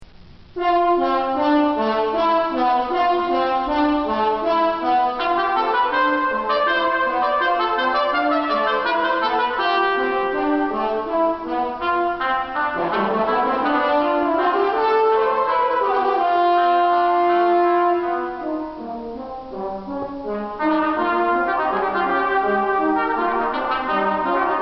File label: Fanfare